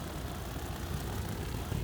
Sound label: ship engines; World Of Warships